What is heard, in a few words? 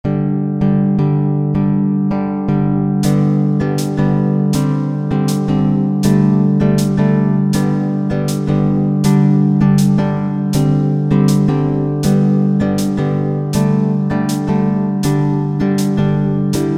Piano Test